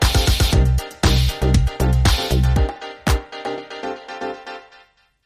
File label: Piano, Sting